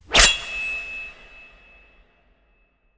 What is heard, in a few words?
sword hits; soundscrate